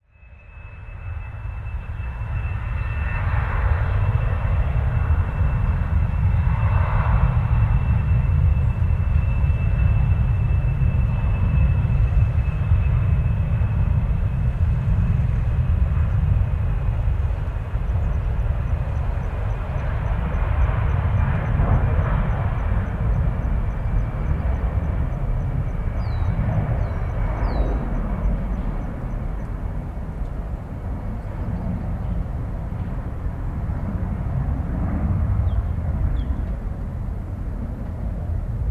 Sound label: Passenger jet taxis onto runway and takes off with crickets in background. Planes, Aircraft Tarmac. Airport Jet, Passenger